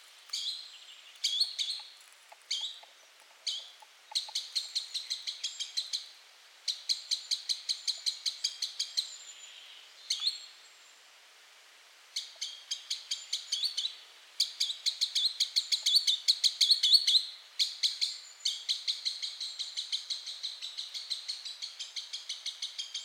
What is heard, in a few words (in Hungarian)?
erdei canko hangja